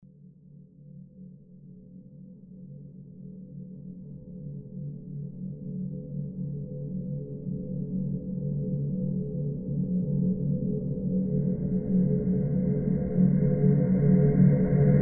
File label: Crescendo